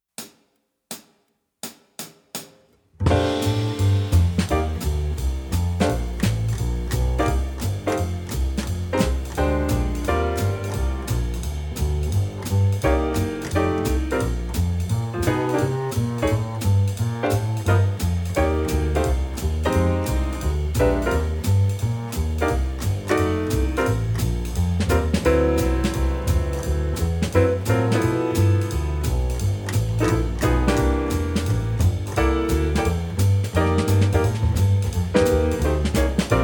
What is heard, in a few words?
Rhythm Changes no guitar